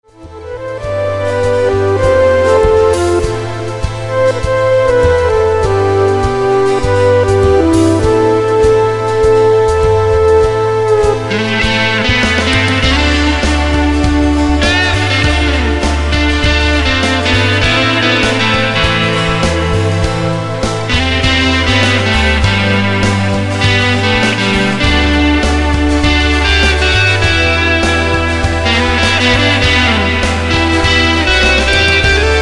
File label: BLUE SAMBA